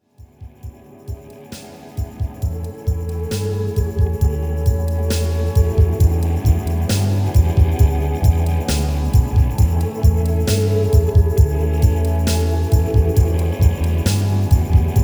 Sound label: Filter Dub